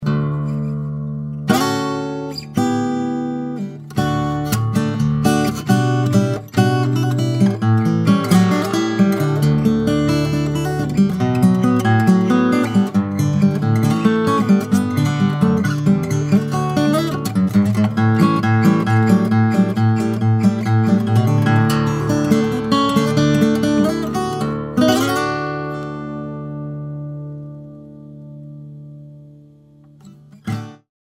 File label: Guitar sample